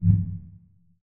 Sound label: hover